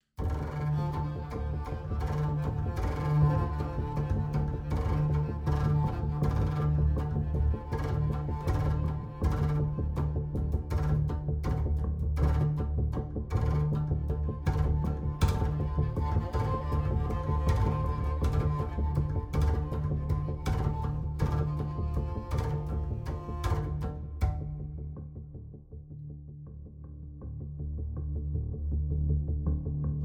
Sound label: without double bass impro